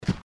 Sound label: footstep; Keep Out! A Dungeon Adventure for the Brave